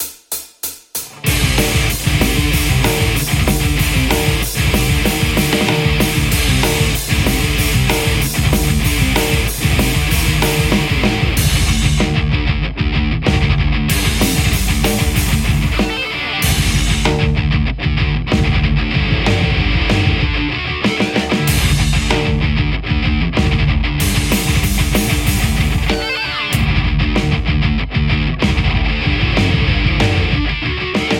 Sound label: metal Les Paul; kuassa